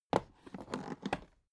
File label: alu case small handle